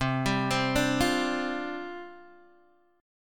C Suspended 2nd Suspended 4th, down, Guitar, Open D